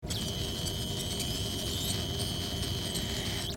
laser scalpel

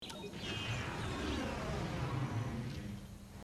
alien door

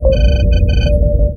ambient bridge, computer

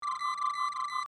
tricorder

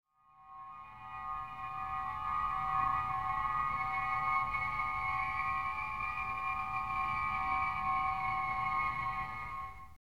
transporter

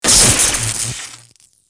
console explosion

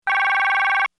alert, computer

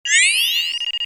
console, computer; DS9; Odo's Console

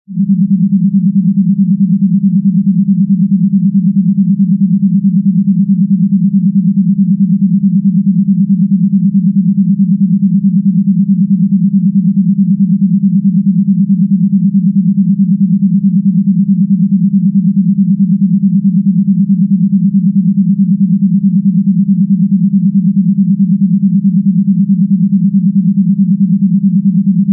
engineering, background; TOS

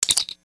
chirp clean; communicator; TNG

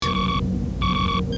computer beep

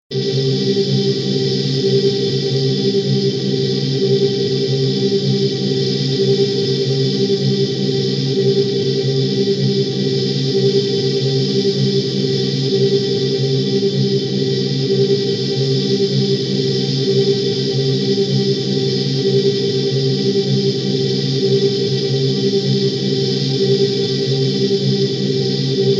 power room; background; TOS

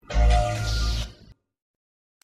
forcefield off

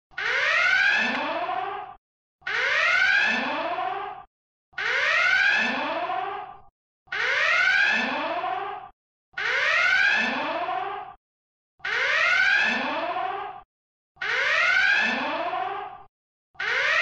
dual red alert; TOS

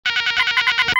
computer beep; Klingon Computer Beep 2

